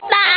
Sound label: laugh